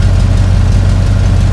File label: buick; Mafie 1